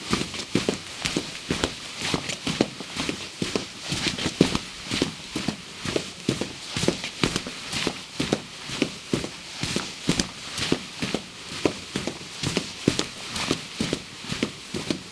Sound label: Fast Footsteps On Fabric Floor Looping